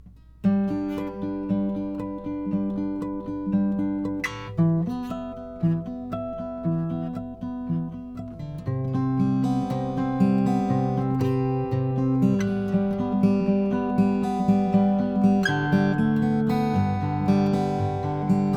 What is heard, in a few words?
h12 limited all koa, guitars; froggy bottom